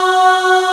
Aahs F#3; Proteus 2000; Digital Sound Factory Proteus 2000

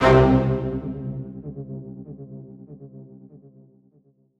Brass 6 - C; Instrument Oneshots; 99Sounds; Monosounds

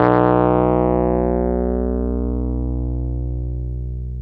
PNO, Wurly; Roland LCDP10 Keys of the 60s and 70s 2; Wurly 200

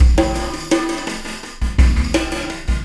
Beat, Processed Drumkits; Event Horizon

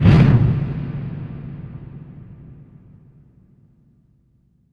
impact flame, Cinematic Impact

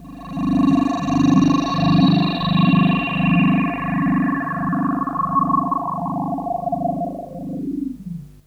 FX, Drum & Bass

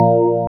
ORGAN A

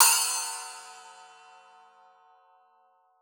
BELL, DRUMS; Xsample VOL-9

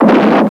Expl, Weather

